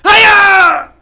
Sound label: ahgieie; Army Men; GreenUnits